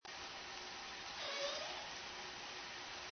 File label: transient call